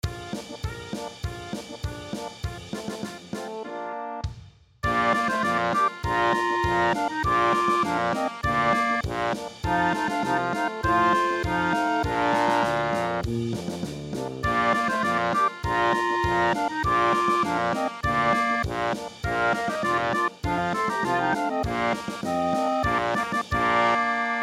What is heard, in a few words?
polka posaune